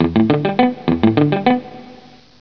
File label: GUITAR